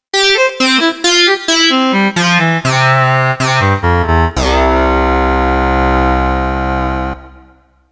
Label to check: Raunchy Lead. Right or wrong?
Right